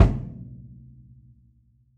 Kick Felt; One Shots; Drums; Yester Years

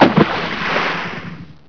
splash; Active Worlds

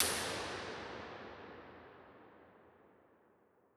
Real Places Cellar L, impulse responses; Norlac; Ableton Live 12 Lite; Hybrid

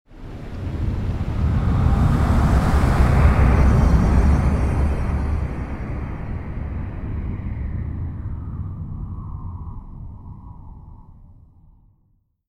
Ancestor Depart; Journey

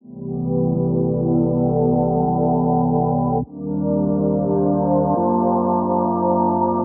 Space Paddy C 140 bpm, Synth; Loops; Tonal; Ableton Live 12 Lite; Core Library

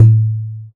Tabla; Drums; Misc Percussion

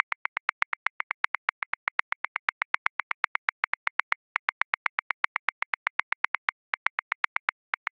Hip Hop Loosely Flow 16ths 80 bpm; Grooves; Ableton Live 12 Lite; Core Library

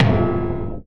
Impact Short Metal

